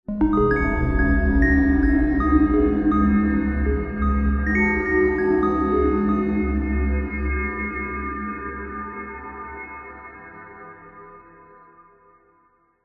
horror ghostly ominous haunted musical riff chime scary